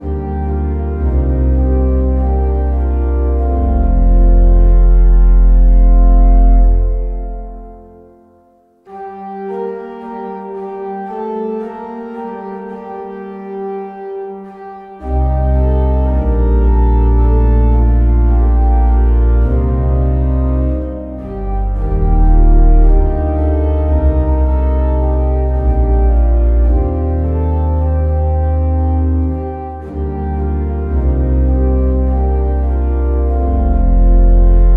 organ, hymn; te lucis ante terminum; Hymnal 1982

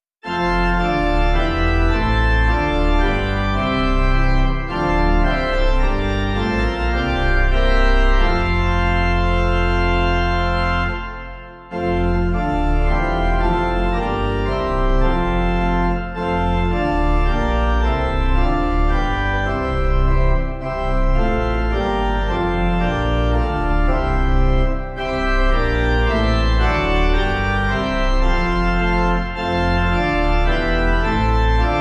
Hymn Pipe; Praise The Lord Rise; Gottes Segan; Hymnal 1982

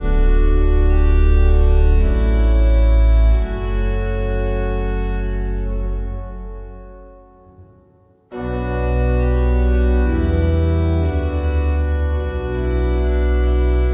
Hymn organ nocte surgentes; Hymnal 1982

